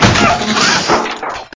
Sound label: Robot Explosion; Clickergame